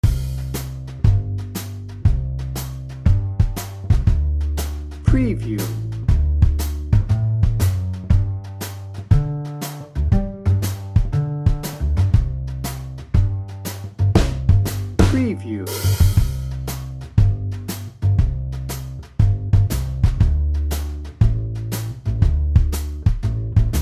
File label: big band swing dnb